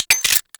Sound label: Gunblade Mech; Warframe; Grineer